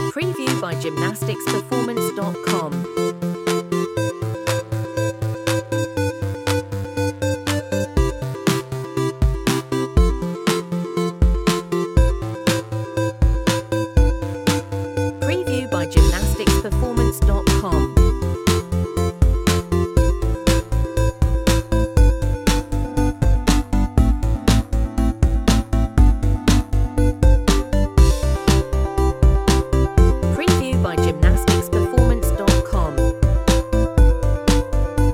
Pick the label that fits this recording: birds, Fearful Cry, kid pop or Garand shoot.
kid pop